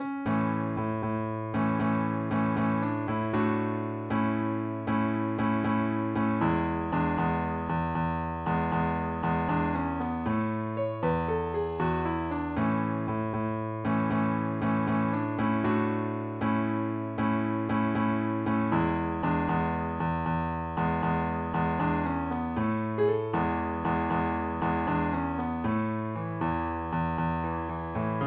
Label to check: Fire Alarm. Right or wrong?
Wrong